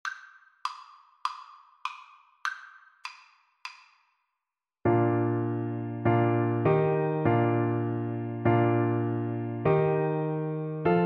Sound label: o little town, Tuba